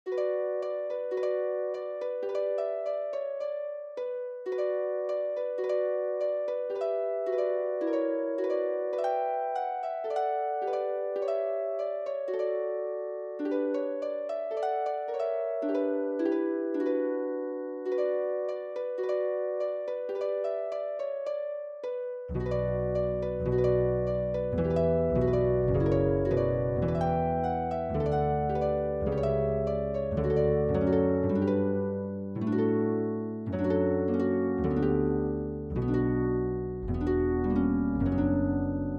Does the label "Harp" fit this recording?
Yes